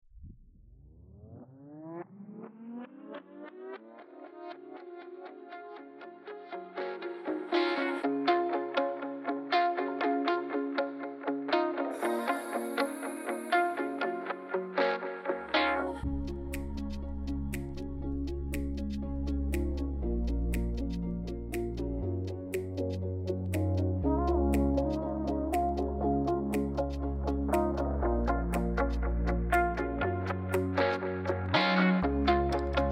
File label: Instrumental